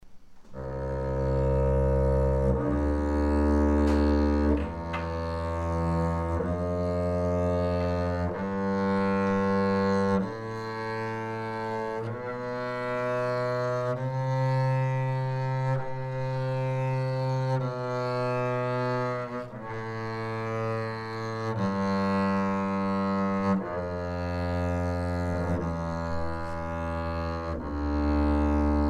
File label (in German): Kontrabass